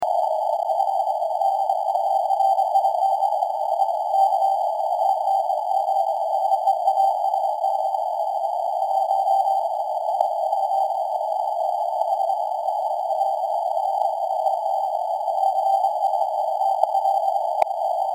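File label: 20 meter very weak CW 300Hz BW; variable CW filter 775Hz / 50Hz bandwidth and the nLMS Noise Reduction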